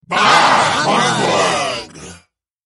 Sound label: bahhumbug crowd